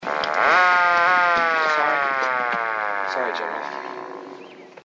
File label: general alarm